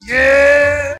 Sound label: "yeah" SUNG